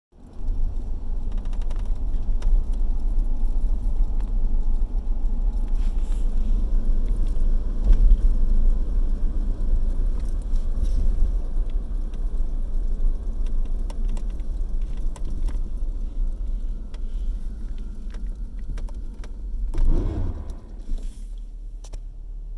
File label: suburban brake issue; tahoe